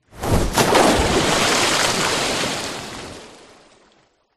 impact object fall whoosh into water splash

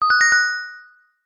multimedia game sound gem treasure found

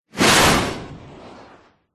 warfare missile rocket small launch fizz whoosh

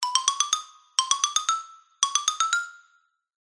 cartoon xylophone ascend high pitched; zapsplat

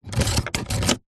vehicles car hyundai trajet heater temp dial turn; 2006

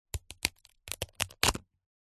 food papadum snap break

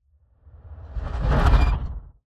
Just Transitions Creepy; soundbits

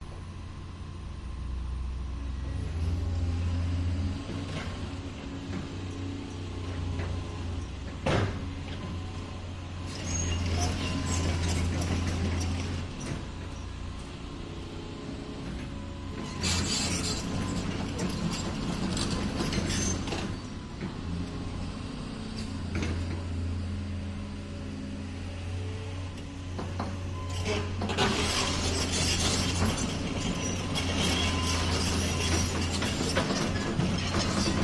excavators contruction site moving idling digging; your free sounds

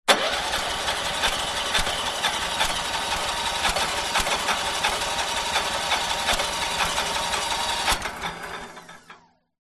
woodchipper petrol motor engine false start; industrial

